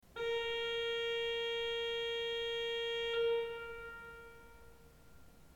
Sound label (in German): ton, orgel